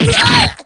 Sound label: damage; p4u; ken